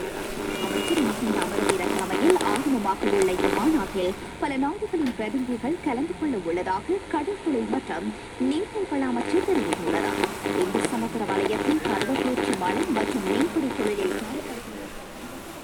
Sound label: An Old Radio Playing In A Sri Lankan Sewing Factory